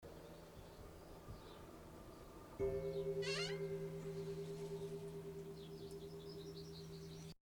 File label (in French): cloche; Brouilla, cloche 2